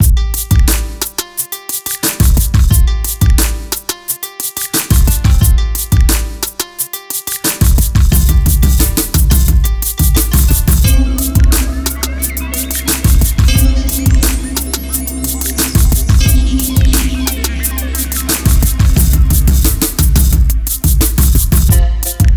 Asian Beat; Zetra

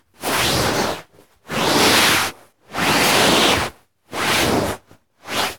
Textile Swishing Moves

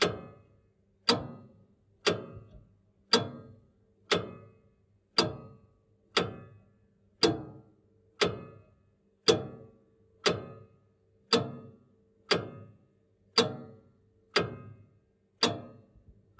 Foley Object Clock Old Tick Sequence

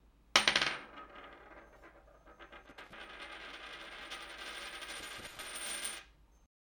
Coin

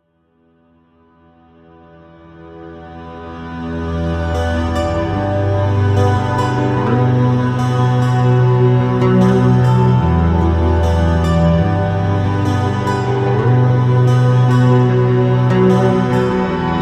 Reverse Reverbed Remix Of Guitar Recording

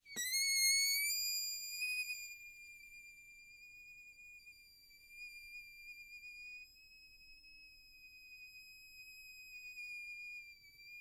Balloon Deflating

